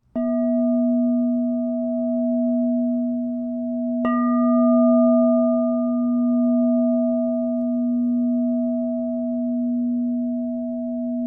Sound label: Crystal Tones Singing Bowls